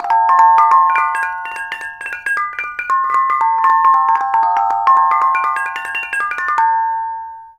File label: KONGWONG; Spectrasonics; Heart of Asia; KONGWONG PH